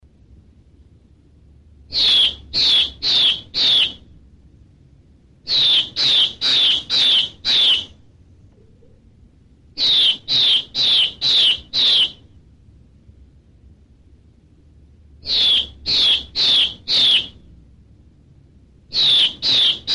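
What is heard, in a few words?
bird song of the hwamei; tieng dong vat chim hoa mi; nhac chuong tieng chim hoa mi hot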